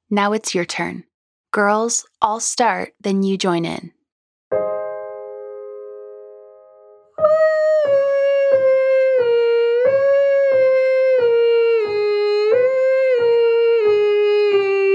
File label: Wee Mixed Descending Scale Female Version